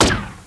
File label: Atlantean Needle; Playable Tutorial Level; TR1